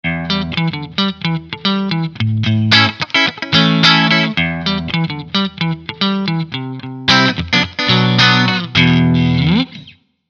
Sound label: Jackson DK2 Neck Middle clean